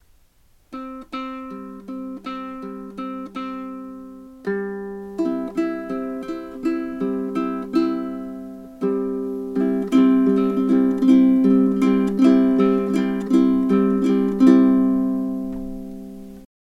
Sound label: halali